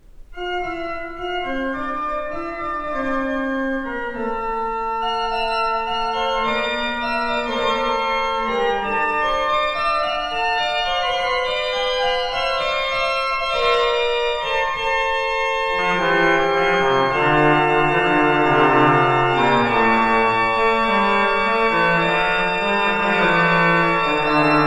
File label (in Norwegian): Basse de Tromette